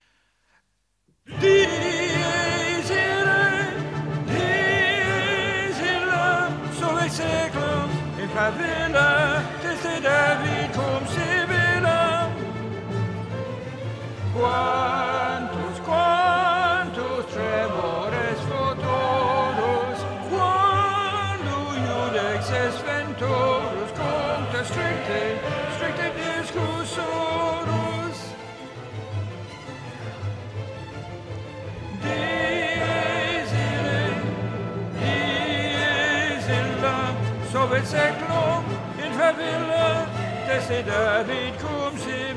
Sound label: Tenor Dies irae; Mozart Requiem